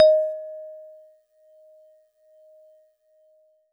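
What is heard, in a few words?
Celeste DX100 D#1; Pristine Celeste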